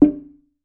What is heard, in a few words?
African Wooden Drum Low; ETHNIC and WORLD PERCUSSION